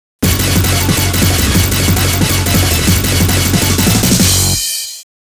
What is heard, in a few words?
Target Destroyed (Stage Clear); The Onslaught; Raiden Fighters